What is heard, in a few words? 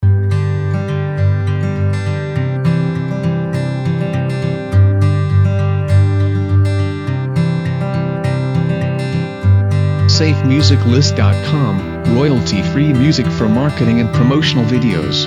Warm Summer Evening